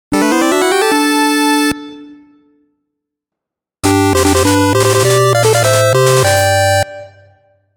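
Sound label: stage clear fanfare, stage clear fanfare; suteeji kuria fanfaare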